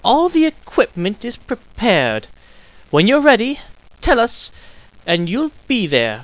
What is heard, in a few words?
ahh, scientist